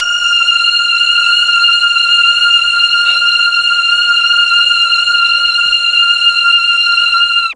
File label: F4 16 violin, mellotron; TRON 16VLNS